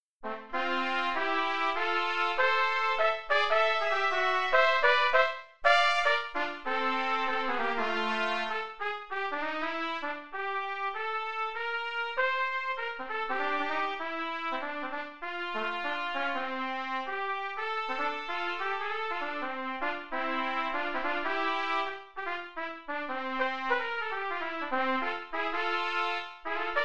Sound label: new orleans duets